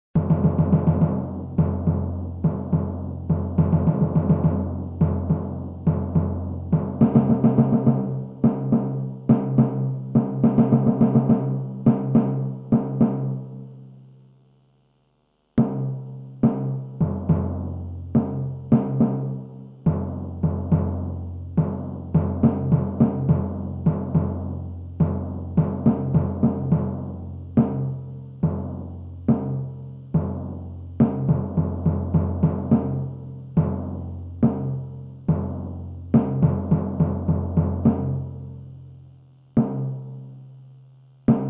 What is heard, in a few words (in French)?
petit duo timbales